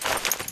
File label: lr300 draw